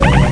TORPEDO; m-trek; solotrekii

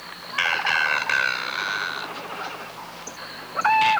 jungle; Sexy Games 3

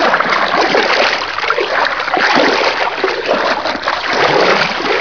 churn; PC Gamer IT CD 41; Daikatana

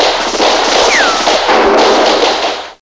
battle

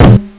cone

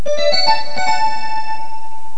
victory

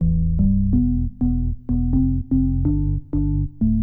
organ house 125 A; eJay; Keys

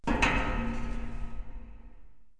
player duct

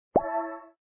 Bonus; smashing

